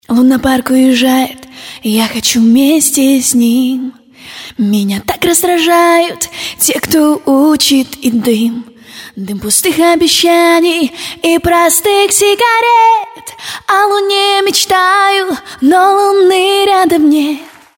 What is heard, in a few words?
acapella